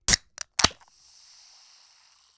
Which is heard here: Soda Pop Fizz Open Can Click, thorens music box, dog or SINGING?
Soda Pop Fizz Open Can Click